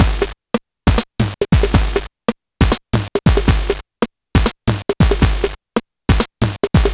LSDJ 4 to the dirty Floor 138BpM, Nintendo Gameboy Loops; maurice.rebell Loops